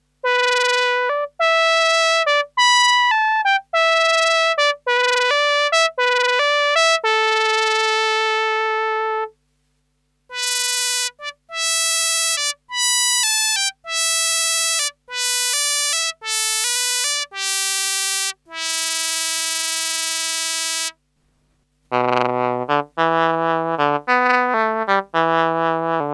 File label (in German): Teisco S100P TRUMPET MUTE TRUMPET SAX BASSON mit Growl